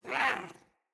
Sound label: Pet Speak; Enemies; Catbrow; Pet Ship